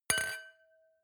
wine glass similar clink bounce; soundpool

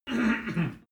male harrumph; menschen; koerpergeraeusche; soundpool

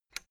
fence gate latch rattle single; objekte; tueren

